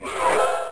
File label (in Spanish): Monje Toke; Grounch